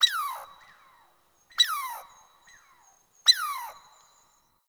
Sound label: Zone Tree Critters, Ambience Plains; Warframe; Eidolon Plains; One Offs